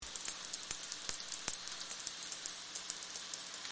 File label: clicks, SRKW